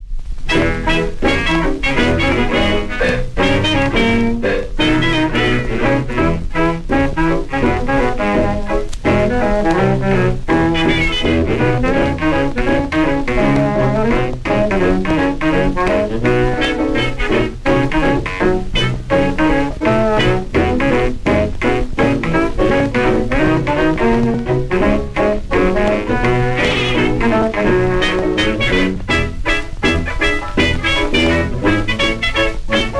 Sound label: Dance Band; 1927